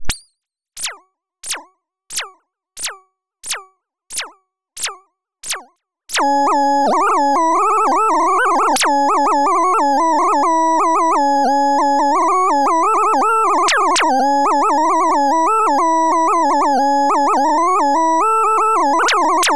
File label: rhythm